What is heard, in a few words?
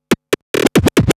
Scratch Fills 140BPM